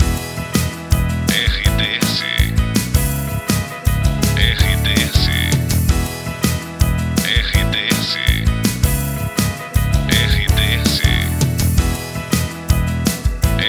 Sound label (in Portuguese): TRILHA PARA LOCUCAO POPULAR